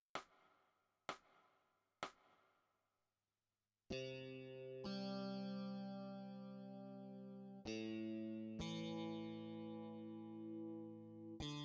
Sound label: E Guitar